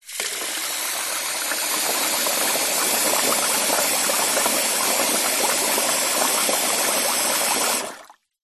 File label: household tap faucet run into full plastic bowl sink; zapsplat